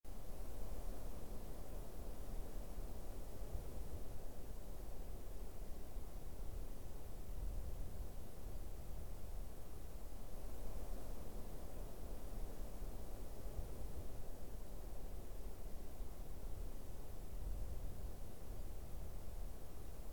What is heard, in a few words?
fog